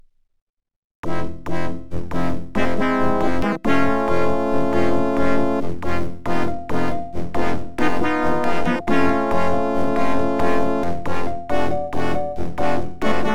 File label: OPL2; X WING